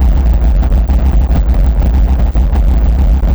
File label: Red Power Loop; Dystopia; injection